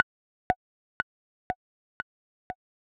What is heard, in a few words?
page start loading, ChromeVox Earcons, No Reverb